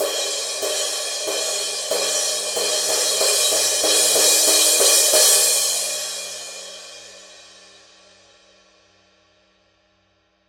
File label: wild crash pattern; paiste; rude